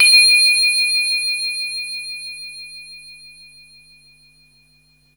Synth Bells; Dream Zone

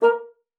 bassoon, A#4; Xsample VOL-6

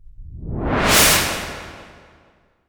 IMP WIPE, Cinematic Impact

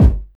bass drum, 135 BPM, Drum & Bass; Ueberschall; Drum & Bass Flash Trackz

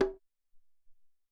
Doumbek

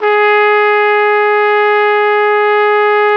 Flugelhorn, Solo Brass

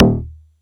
Funky Pick d#1, Yamaha YX81Z

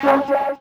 Fx Vox; Equipped Music; Loungin House

